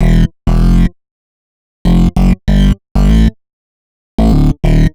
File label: Bassline 97 E, hiphop, 97bpm, Bass; musicradar